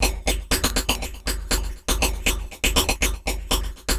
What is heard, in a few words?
Percussion Loop; Drums & Percussion; Electronic Point Blank